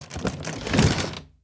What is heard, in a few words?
chest sound